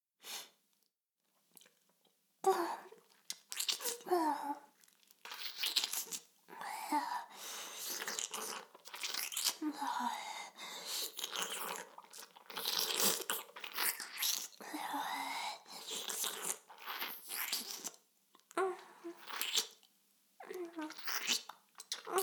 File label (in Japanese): 耳舐めシーンまとめ, ASMR; ＮＴＲ音声手紙(LR逆ver)